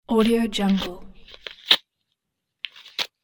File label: paper tearing